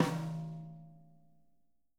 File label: Living Drums, JZ XTOM 1